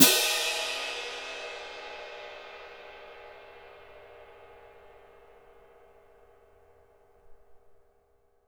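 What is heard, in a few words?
cymbal, Orchestral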